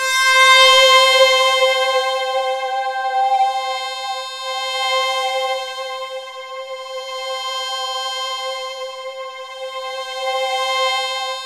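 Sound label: DEEP ATMOS, Kurzweil K2000; BASIC-KW2000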